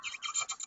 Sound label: Squeak; Melomy; Invented Instrument